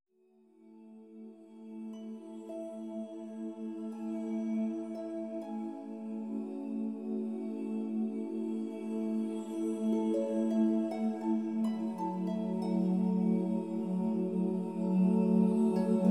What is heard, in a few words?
Celtic Harp